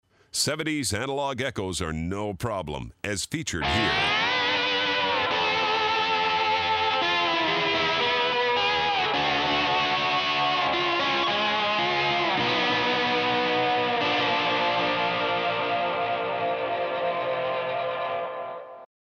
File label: DE7 70s Echo